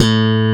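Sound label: WHACK BASS A3; Drums In Your FACE!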